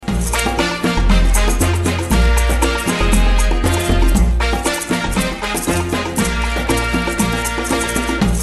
maracas song; fortnite emotes

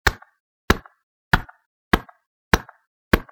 clap sound; fortnite emotes

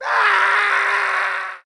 scream

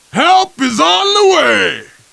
radio

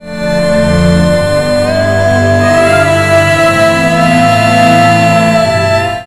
lose; avatar

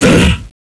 big hit

